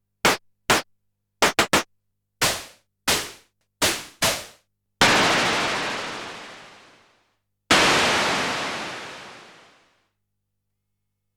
Gamechanger Audio Motor Synth MkII Some Percussion Presets